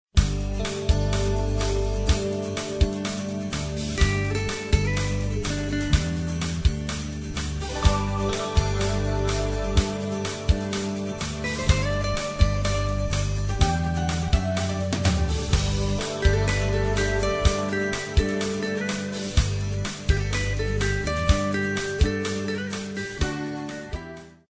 ez pop

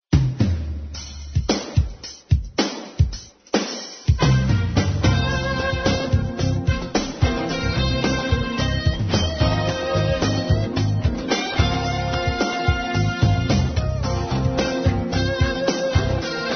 jazz pop

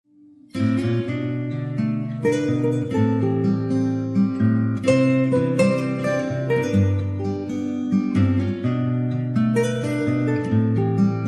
acoustic guitar Afternoon Sunlight

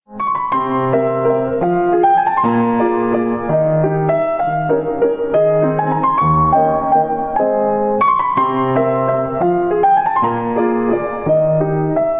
Waltz; Schubert

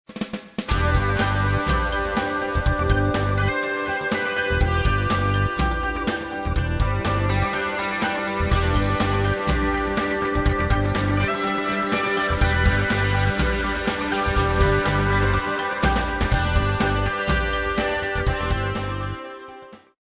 pop rock altern

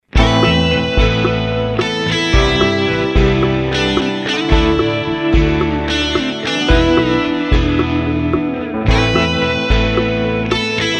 Pop Rock

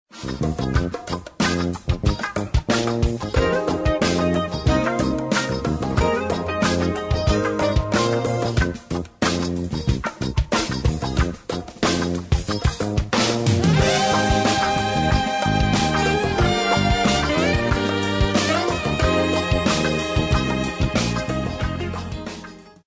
jazz pop

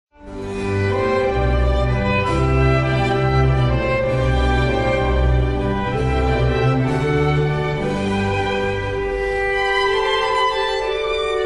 Concerto Grosso; Corelli

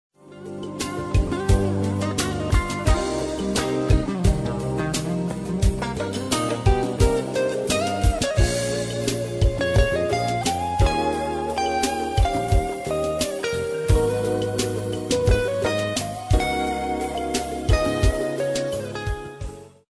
jazz smooth

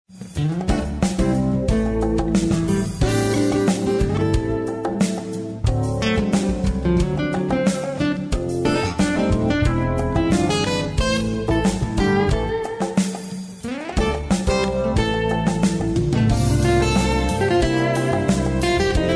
jazz smooth

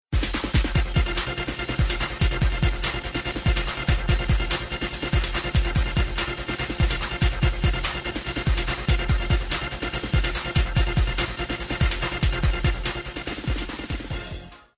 pop dance

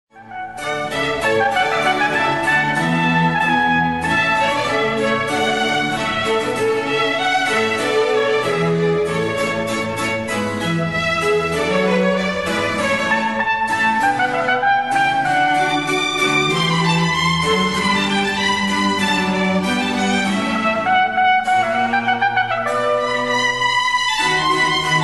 Concerto for two Trumpets; Torelli

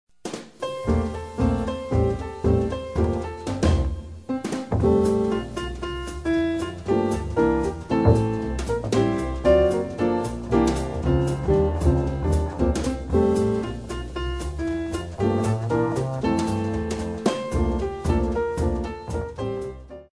Jazz

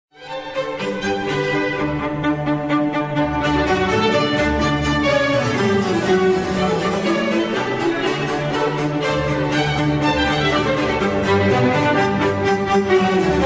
Flute Concerto G; Quantz

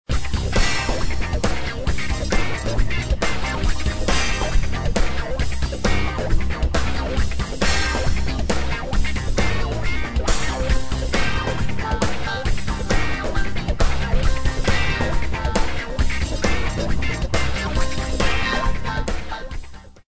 rock funk

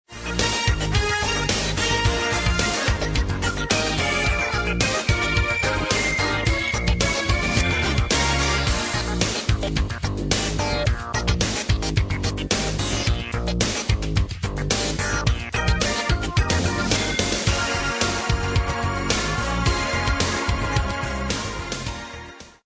pop dance

